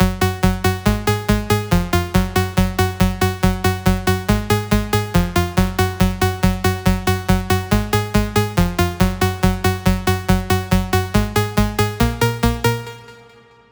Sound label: 140BPM G#; Vengeance - Essential Clubsounds Vol.4